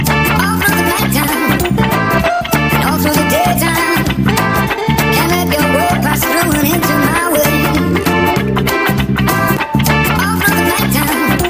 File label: Beautiful Rap Ring